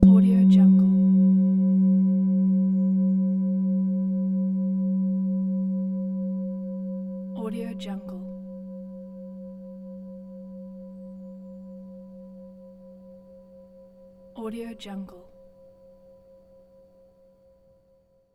forged singing bowl

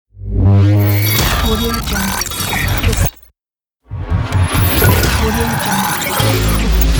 transform

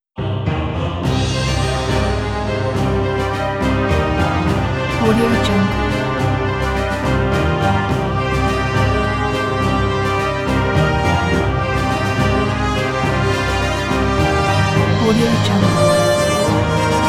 epic fantasy theme tune

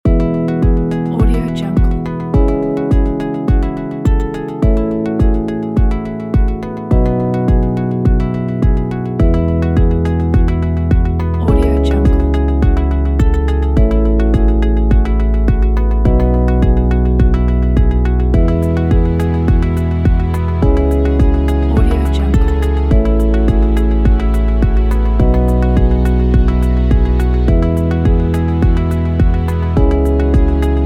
positive corporate background

